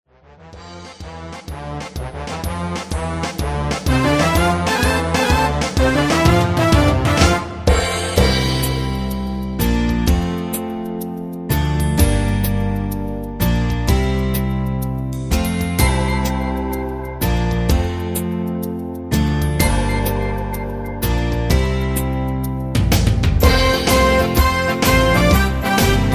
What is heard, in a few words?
instrumental